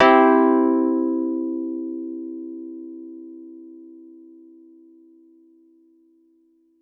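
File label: Piano Wurl major hard; TrashLibrary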